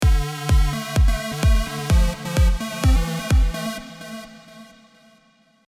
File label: New Rhythm